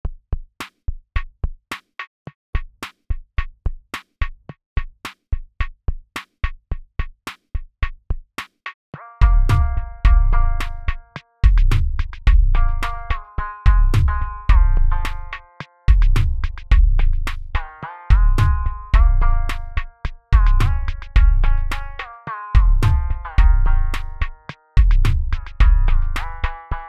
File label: koto funk